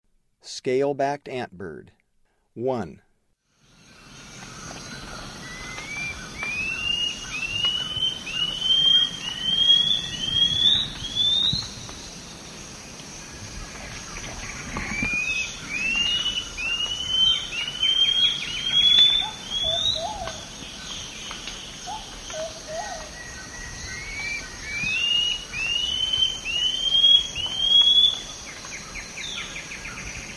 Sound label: Scale-backed Antbird; S. America; Eastern Lowlands